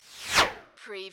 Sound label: CARTOON SWISH